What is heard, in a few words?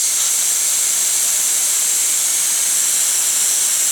Gas Mask; mekanism